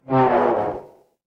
feedback fail

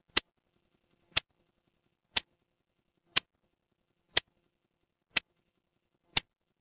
mechanical clock tick

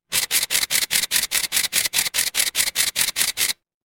office camera

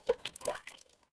water drip

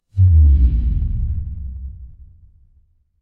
transition sweep

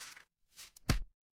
paper fabric page turn

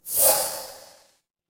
magic scifi spell

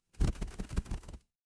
animal wing flap

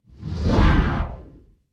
magic scifi teleport

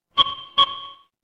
ui beep

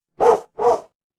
animal dog bark

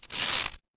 cartoon stretch

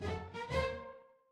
feedback level up

